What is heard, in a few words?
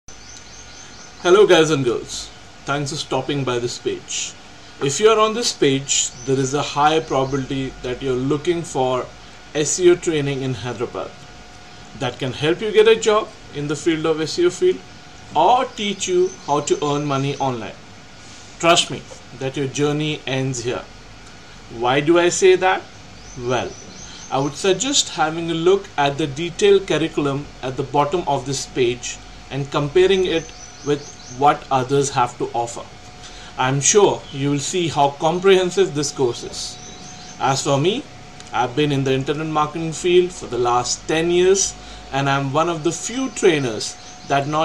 trance audio